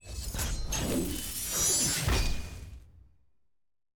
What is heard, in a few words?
celebrate ranked promote to silver; leagues